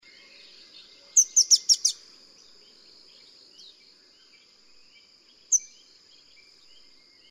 斑紋鷦鶯; 山鷦鶯; 扇尾鶯科/留鳥

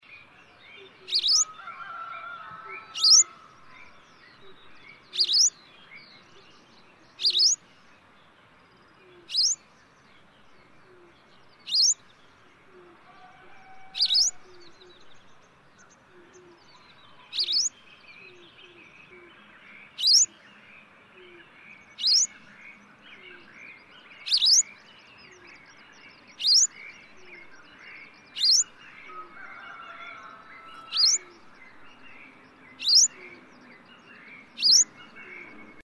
白鶺鴒; 鶺鴒科/留鳥、冬候鳥

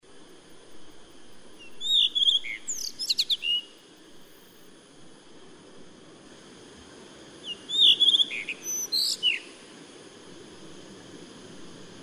藍磯鶇; 冬候鳥、留鳥

